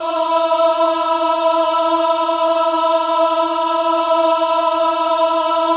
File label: VIB E4, VIBRATO, Voices; Spectrasonics